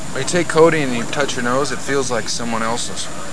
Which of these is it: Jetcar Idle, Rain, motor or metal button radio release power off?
motor